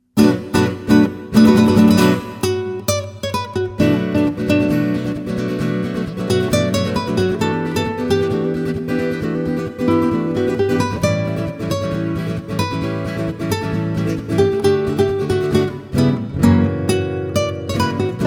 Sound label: Merengue